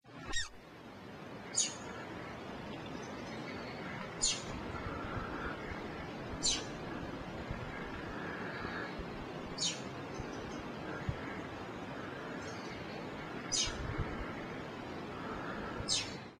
canto do chupa dente do peru

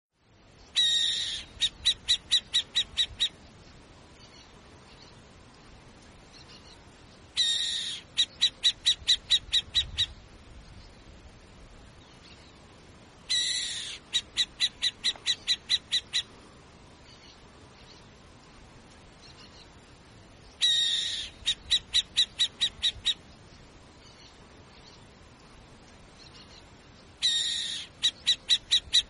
canto da coruja buraqueira

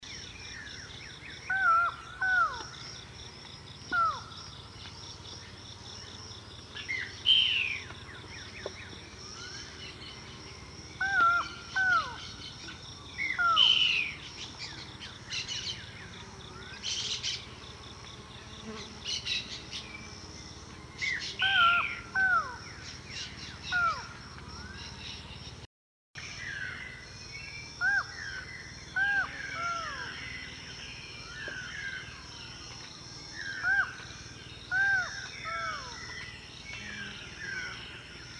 canto do falcao de buckley

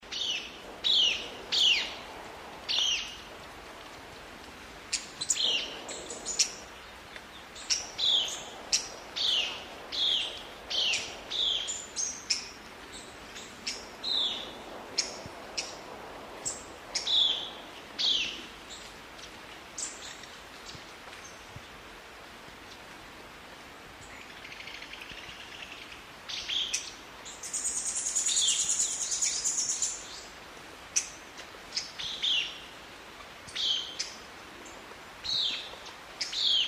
canto do limpa folha coroado

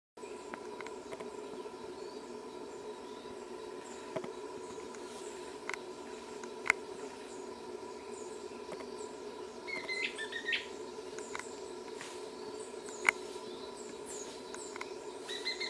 Canto do fruxu baiano

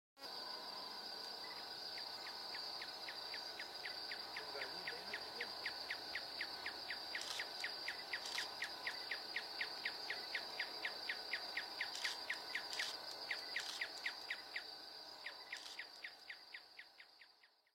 canto do papa formiga de bando

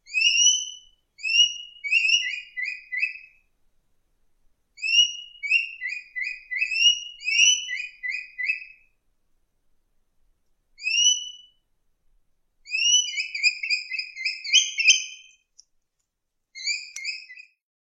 canto do tauato pintadoa

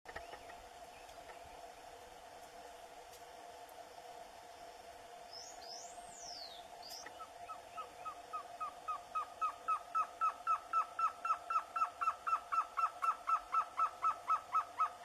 canto do surucua de barriga vermelha

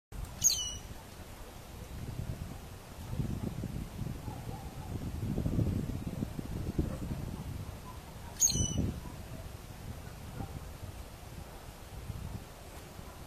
Canto do vira bosta picuma

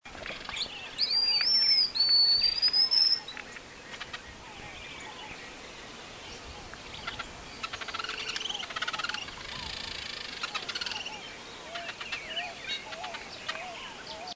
canto da tijerila

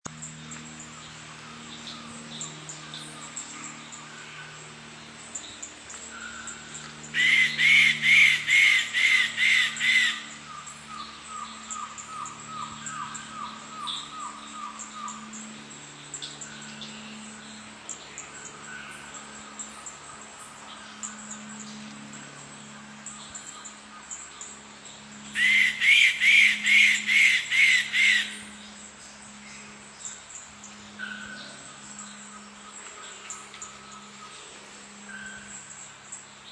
canto do pica pau bufador

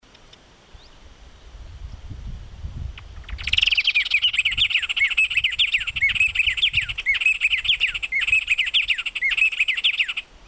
canto da bandoleta

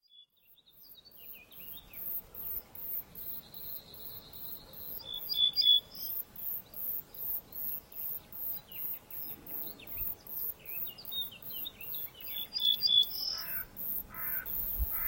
Canto do hortulana

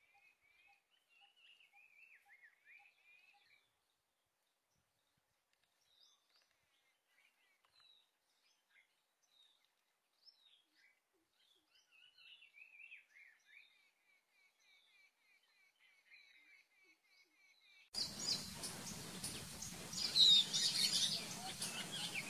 Canto do papa moscas do paraiso indiano

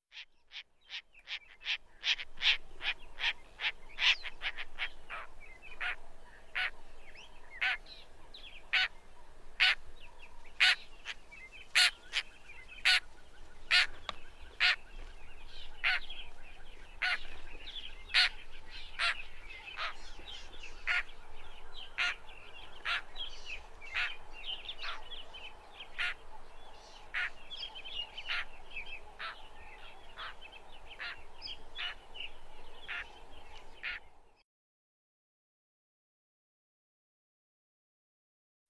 canto do rolieiro de peito lilas

